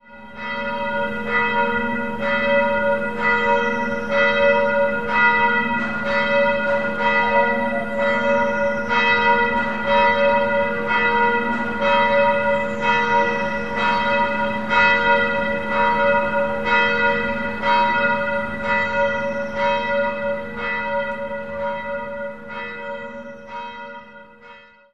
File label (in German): glocke, kirche